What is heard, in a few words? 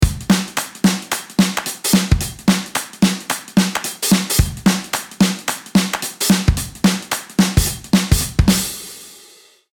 Kit 110, MIDI Drum Kits; Prevail; Unison Essential MIDI Drum Kits